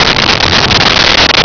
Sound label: chorus